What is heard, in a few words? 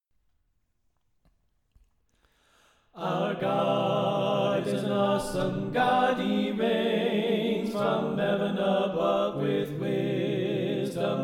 Tenor Left